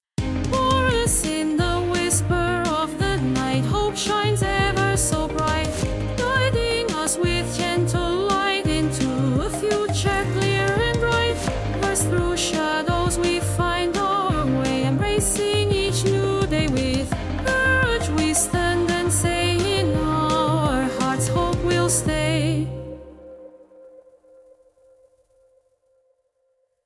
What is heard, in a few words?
Text to Song; Voicemod